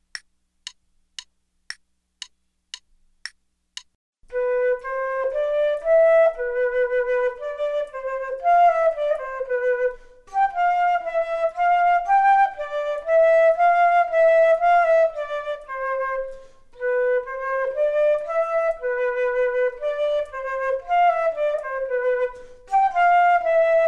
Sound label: Duets flute; Selected Duets for Flute Podcast